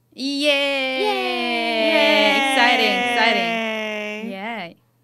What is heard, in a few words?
YEAAAAAAH; IRyS Achan Kronii